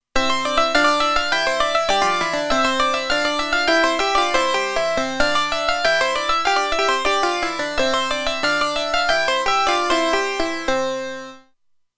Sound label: Harpsichord, Roland Alpha Juno-2; Original Sound Chart patches